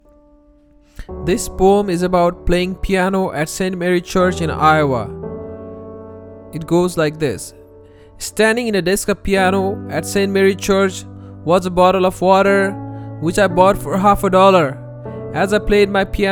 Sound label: piano, St Mary's Church; An old piano; Playing Piano at St Mary's Church